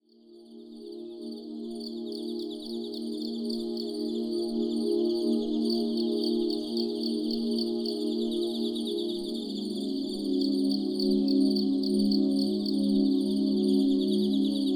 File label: Dawn